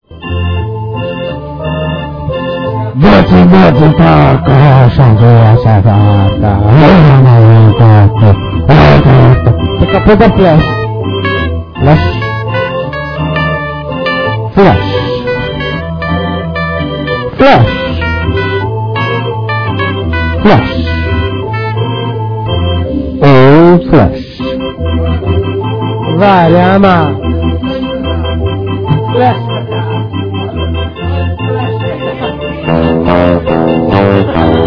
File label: flash metal